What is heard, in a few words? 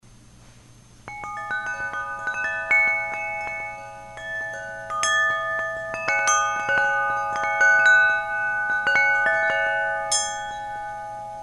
chime